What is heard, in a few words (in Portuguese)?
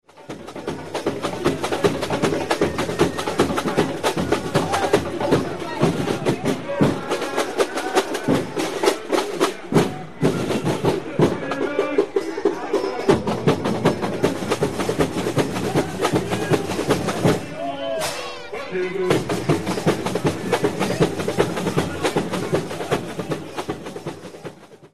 Break Ao Vivo; Mort les doigts enredo; Tijuca; Rio